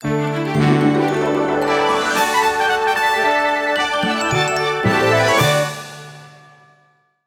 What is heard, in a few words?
Jingle Lesson Completed; Disney Art Academy